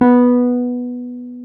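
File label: piano Wurly; Keys of the 60s and 70s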